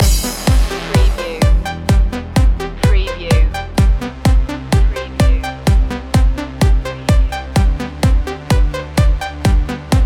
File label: spin me around loop